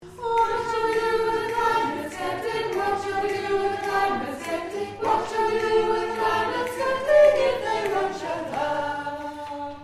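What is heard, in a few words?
Soprano Tune; climate sceptic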